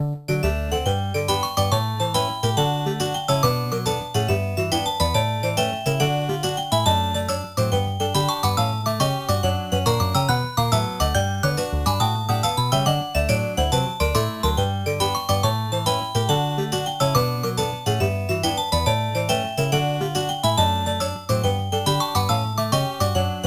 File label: Contest - Results Announcement